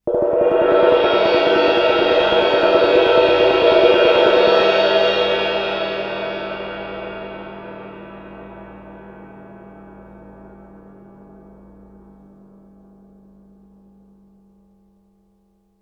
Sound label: ride mallet roll, Suspended Cymbals; Percussion